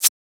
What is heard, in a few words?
Shaker; FLPRO